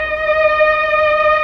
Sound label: STR, Melo. Violins, Tron Violins